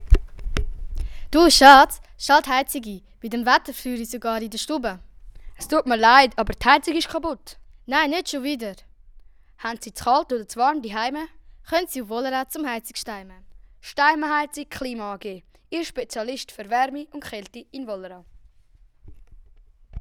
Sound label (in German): Werbung Jingle Heizung Klima; Steimen